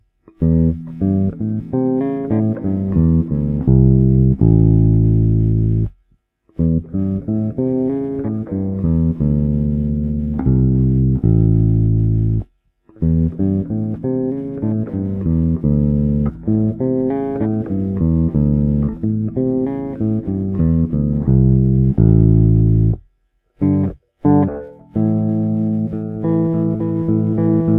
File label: Devastator bass soapbar, 4 or 5 string, alnico 8, modern tone; Bridge